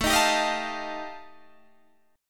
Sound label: G 7th Suspended 2nd Sharp 5th A chord